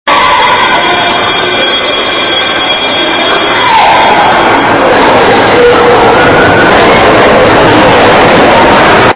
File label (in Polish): dzwonek